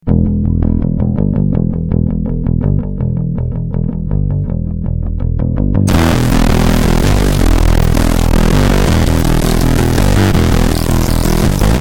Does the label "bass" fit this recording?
Yes